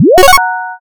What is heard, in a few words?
boot